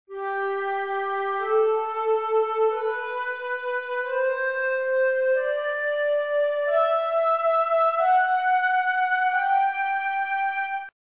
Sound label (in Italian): sol maggiore, scale